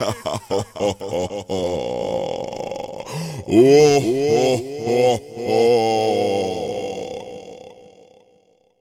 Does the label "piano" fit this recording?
No